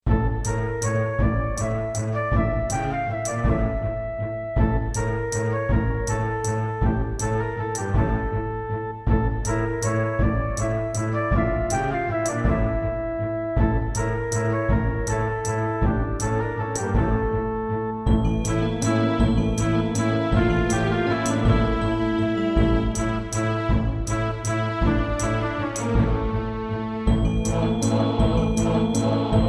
Korg NS5R

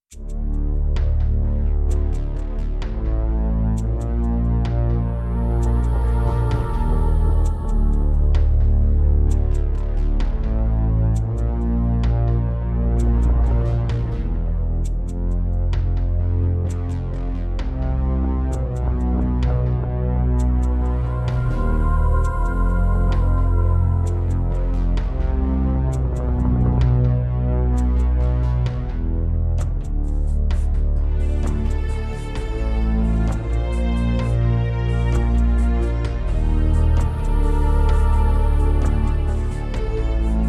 Roland JV-1080; Descent II

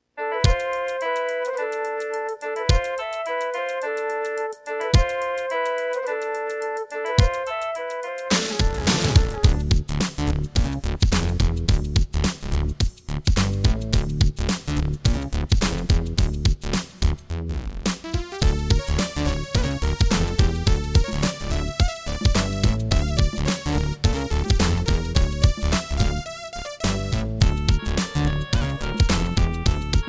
Serdaco Dreamblaster S2; Lost Vikings

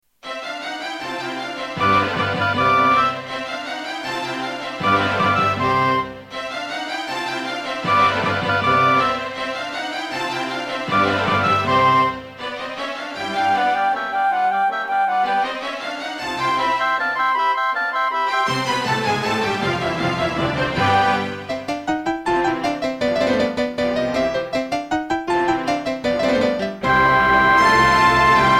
Turtle Beach Maui; classical; Mozart; Concerto K467 partial

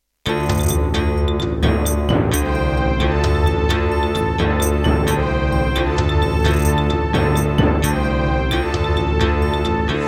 Roland PMA-5, wavetable; Heretic

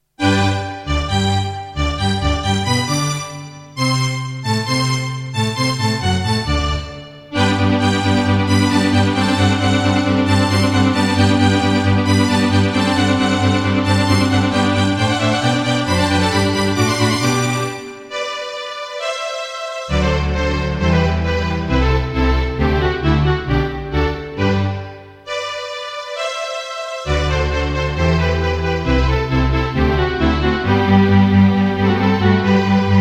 Midiplus MiniEngine USB; classical; Mozart; Eine Kleine Nachtmusik partial

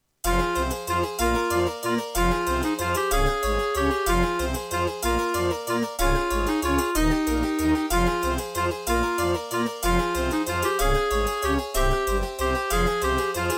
Track 6, Roland SonicCell GM2 TEMPLATE; Death Gate

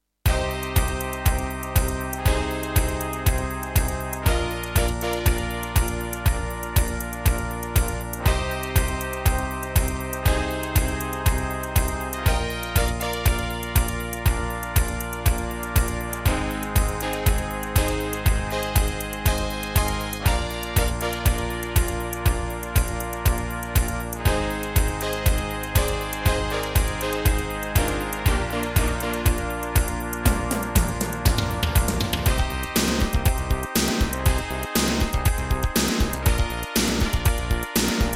Terratec MiniWaveSystem TTWT; Cybernoid II

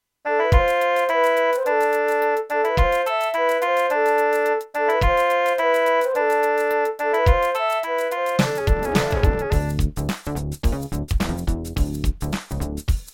Gravis UltraSound Extreme; Lost Vikings

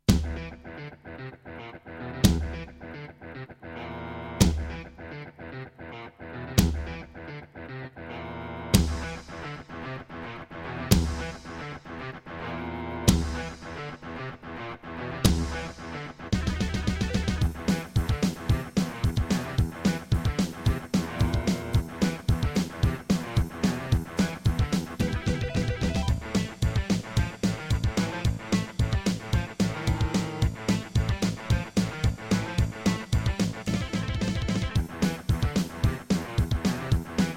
Miditemp DS-48 CleanWave32; Doom - E1M1

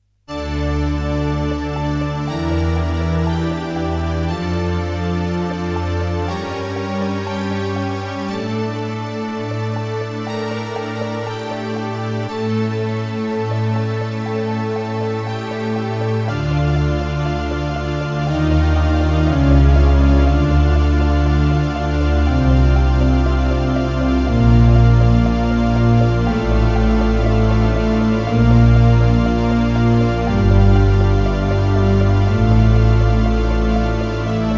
Terratec MiniWaveSystem WT64; Blood

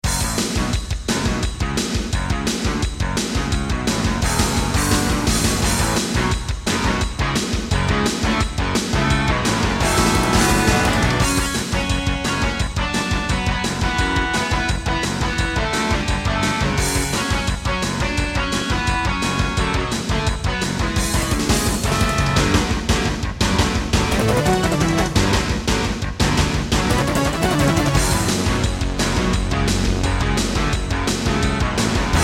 Intro, Roland SC-55; Duke Nukem 3D

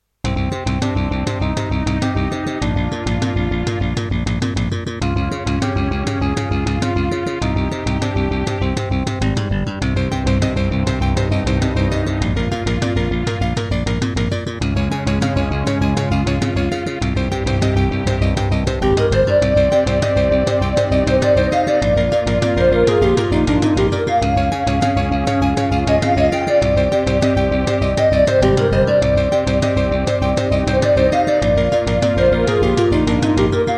Roland CM-300; Hocus Pocus

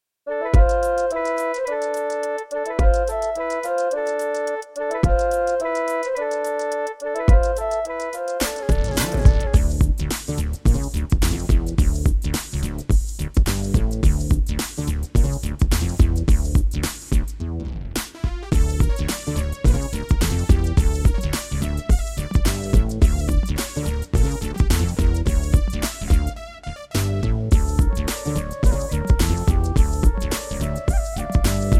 Yamaha QY70; Lost Vikings

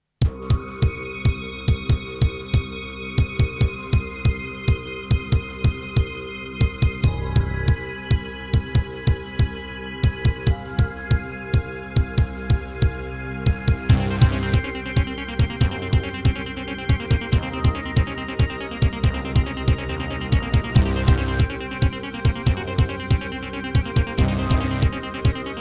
Miditemp DS-48 CleanWave32; sigil doom episode 5

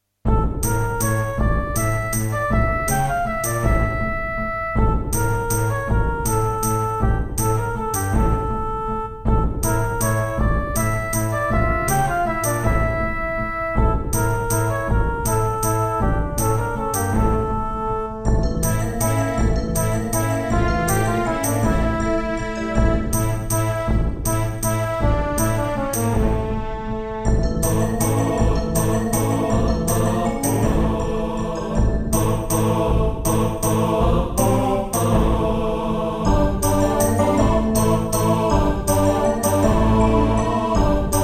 Soundfont; EmperorGrieferus 131MB Soundfont

